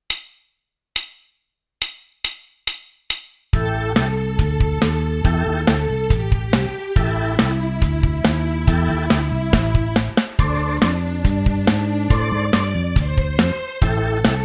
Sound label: Overdrive Track